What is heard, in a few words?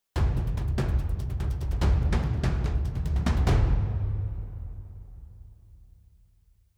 drums rhythm; Netease; kill boss tortoise